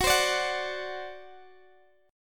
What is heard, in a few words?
C Suspended 2nd Flat 5th F sharp